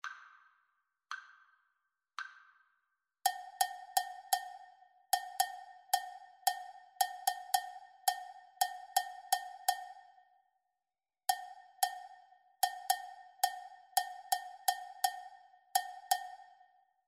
rhythmic dictation

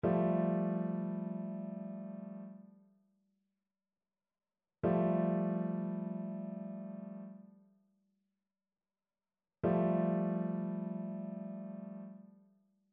trichord; trichord identification

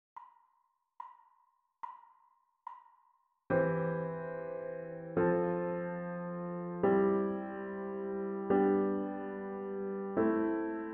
harmonic dictation

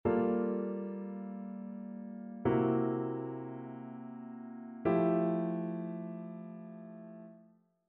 ii V I progressions; ear training

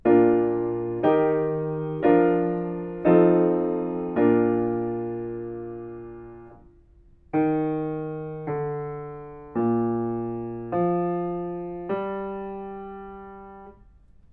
melodic fragments; ear training

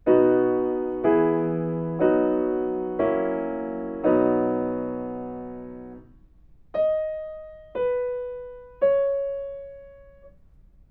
melodic fragments

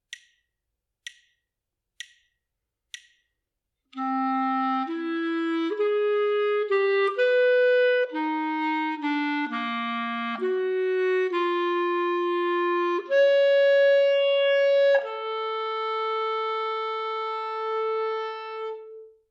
melodic dictation; ear training

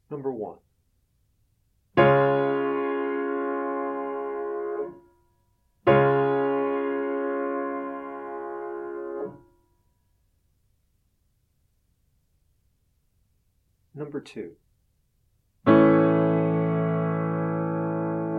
chords; eartraining

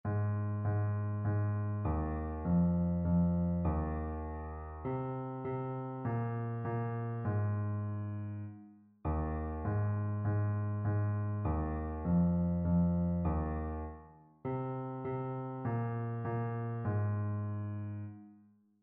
mode identification

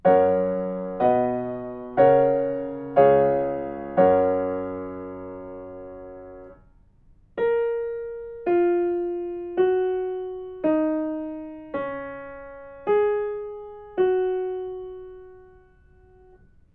melodic fragments; eartraining

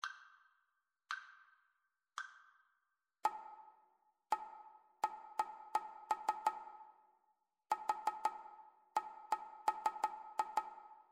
rhythmic dictation

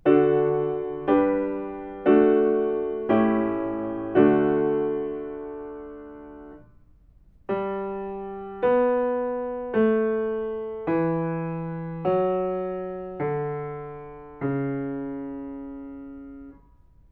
melodic fragments

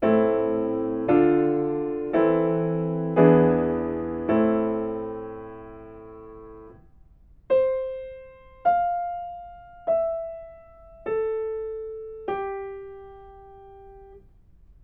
melodic fragments

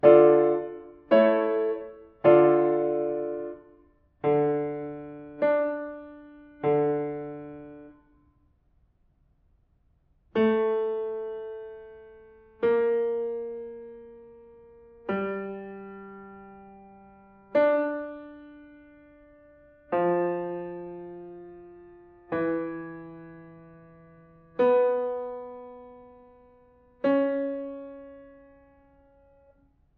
diatonic collections

